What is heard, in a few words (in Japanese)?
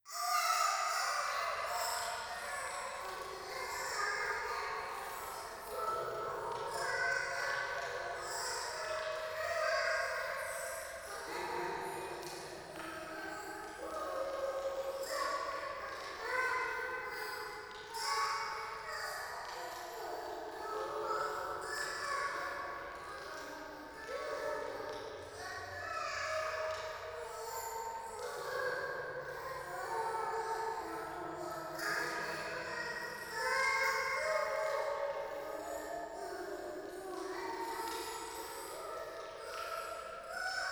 廃墟 シャブ喘ぎ 暖炉